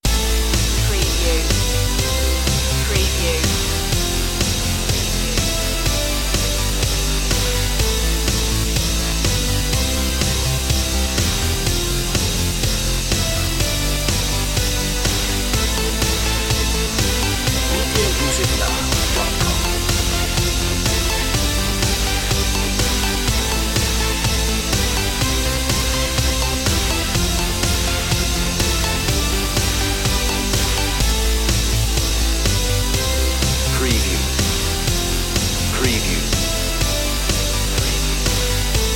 upbeat in the 80s

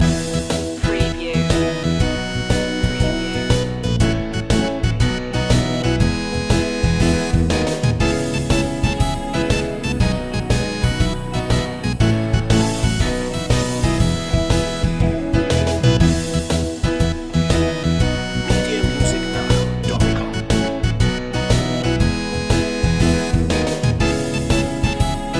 if youre happy and you know it instr loop

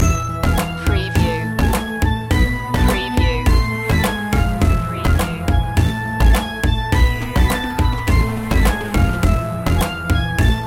retro video game snake invasion loop

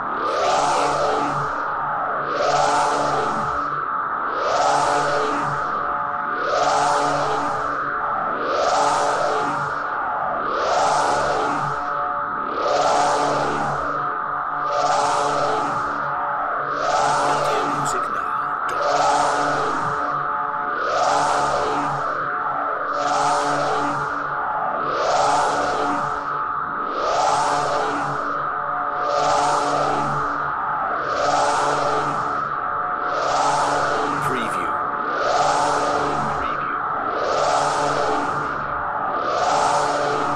alien alarm drone loop

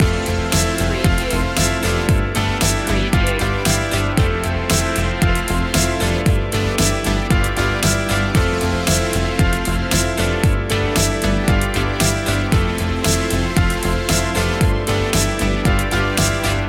streams of light loop

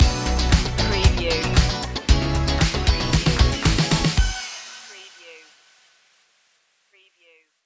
showtime stinger

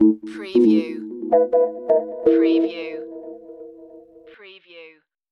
Hip Hop Synth Licks 90 BPM - A - Swing

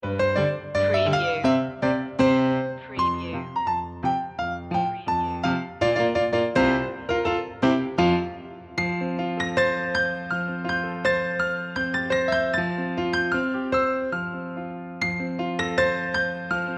deck the halls piano; media music now

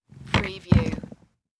Chair Roll Get Up; sound effects bible

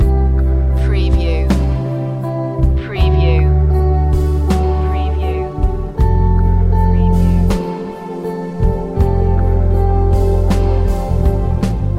delay drops loop

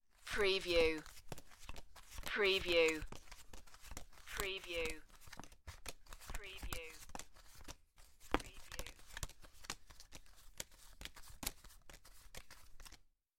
Cards Playing Cards Dealing

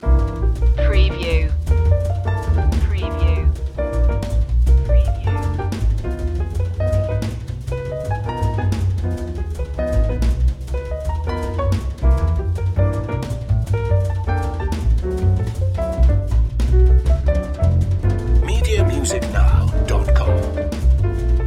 jazz up

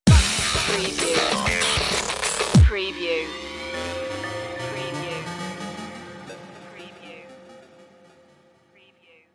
drum stinger

